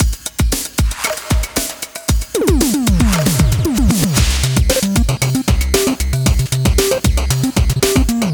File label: drums; Rhythm Quest; rhythm-based; chiptune-style noise drums